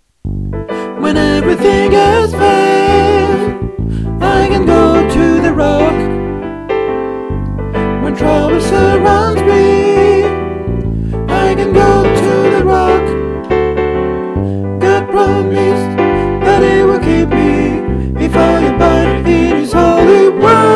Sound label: Alto